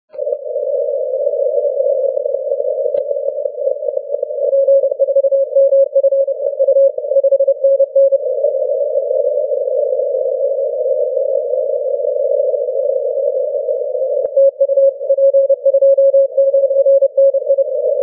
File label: 17m cw